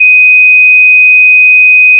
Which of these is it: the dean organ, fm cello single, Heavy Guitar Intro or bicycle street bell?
fm cello single